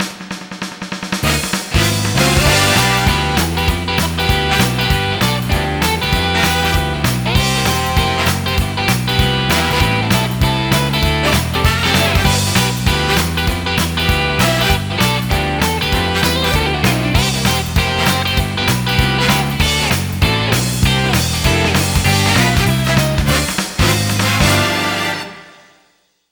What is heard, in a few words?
Swing Bells